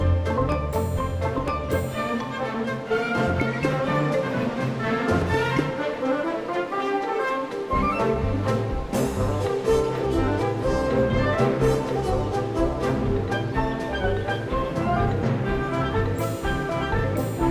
Beach Music; Kinectimals; Android; Xbox 360

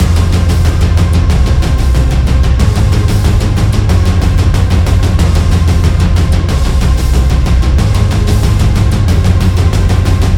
BGM Loop; hidden dragon legend

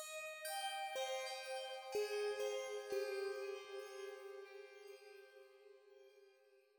crt chimes melody

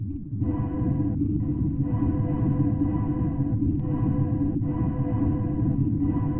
Flesh Tunnels Ambient; gamerip; lsd dream emulator; ps1

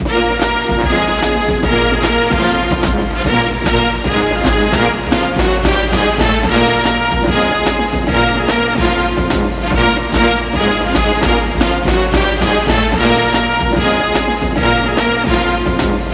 Fight Song

